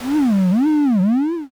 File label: gate